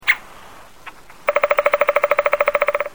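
trehpalyy dyatel golos, three-toed woodpecker voice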